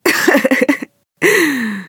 laughter, woman; Stalker Anomaly; human

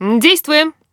attack, human woman fight; Stalker Anomaly; characters voice

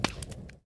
crackle, anomaly; Stalker Anomaly

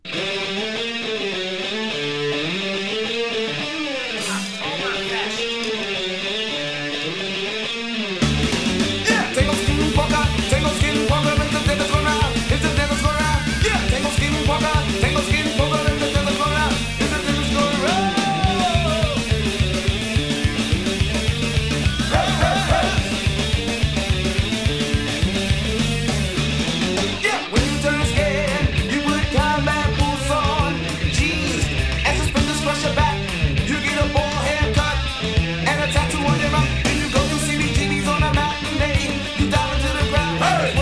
tango polka

